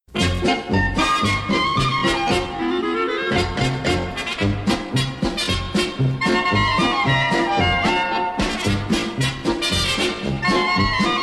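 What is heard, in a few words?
folk dance krakowiak; Druzi v koli; nar. tanets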